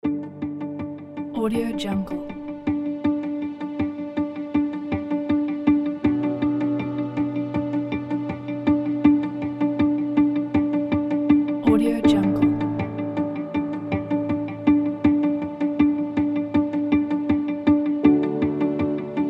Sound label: documentary abstract